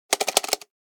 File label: Cassette case shaking with tape in case